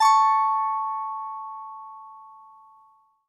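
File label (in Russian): гитарные мелодии струна b с гармонайзером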